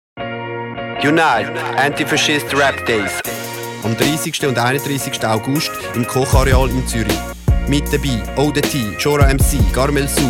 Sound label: Jingle